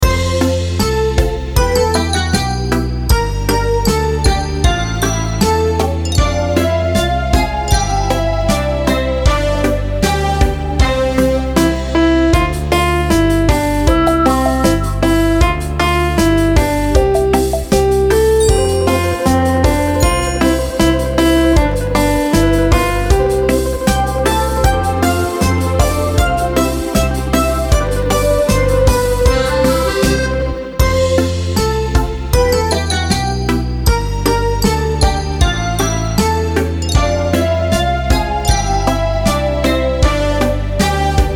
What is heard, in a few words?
karaoke